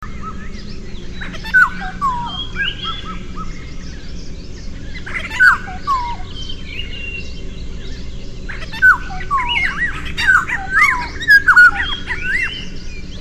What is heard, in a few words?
oriolus xanthornus 2 males song kaziranga np assam